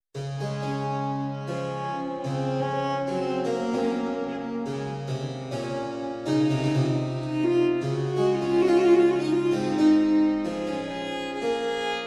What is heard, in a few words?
pipa